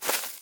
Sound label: Player Walk footsteps grass Walk; SFX